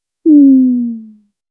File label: very soft and gentle fail, descending tone